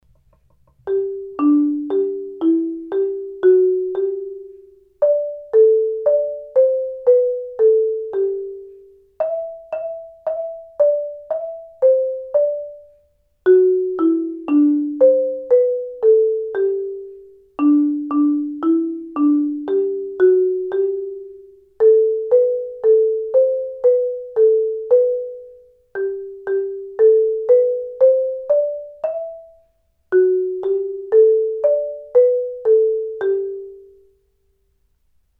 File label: mallets